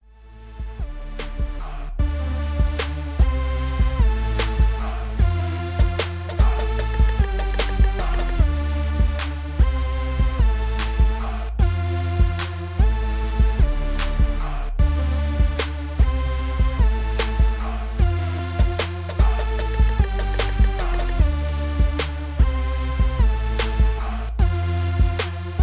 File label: 75BPM